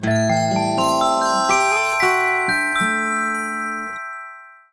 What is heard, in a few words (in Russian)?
Sms Gitara I Ksilofon